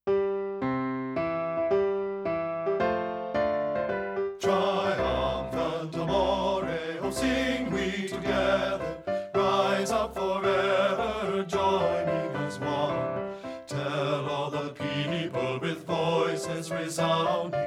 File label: bass